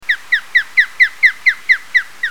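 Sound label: osprey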